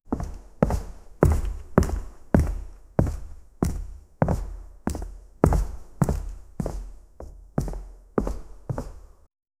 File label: soft delicate footsteps; ambiance